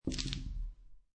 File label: Dice Throw Five Dice On Felt; Dice Sound Effects; Dice (Sound Effects:Casinos)